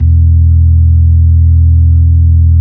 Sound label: B-3 Club Xfade, Keyboards of The 60's and 70's